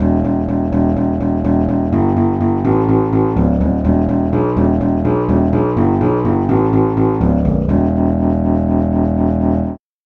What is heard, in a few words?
alto sax bass